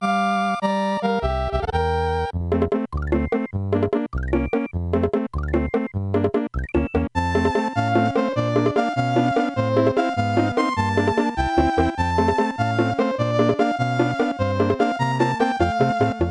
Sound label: Water Game Fast